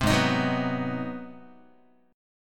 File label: Ab 7th Sharp 9th down Guitar; Ab7#9 strummed; JGuitar